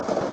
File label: rock